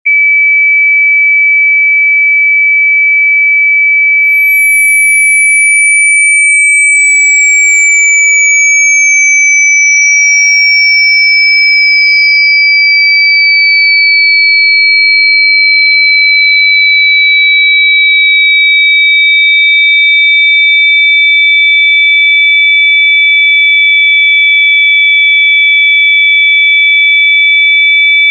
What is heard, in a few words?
120000 and 30000 hz